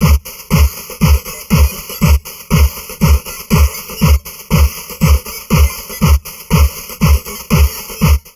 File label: Drum Loops Crazy Processed